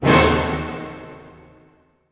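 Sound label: D# diminished fortissimo, orchestra hits; Sound On Sound - Peter Siedleczek's Orchestra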